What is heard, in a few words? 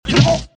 damage